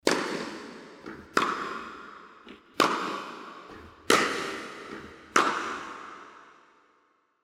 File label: tennis fx